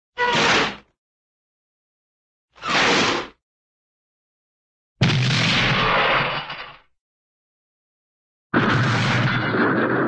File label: crash, cartoon screechy crash with horn